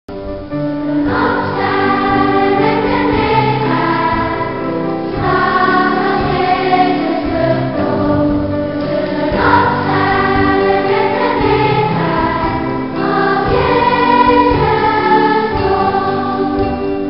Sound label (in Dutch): kinderkoor